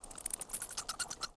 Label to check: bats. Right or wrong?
Right